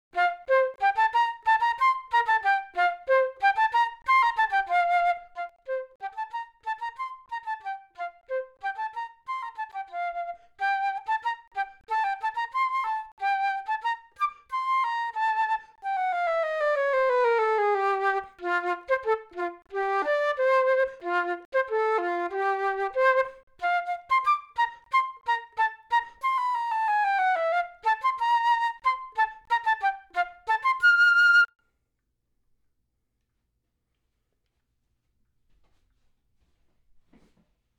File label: Flute Etude